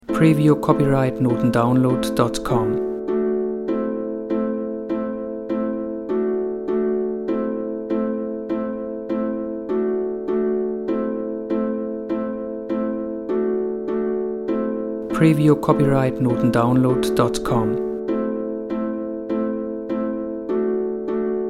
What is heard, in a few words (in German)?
Harfe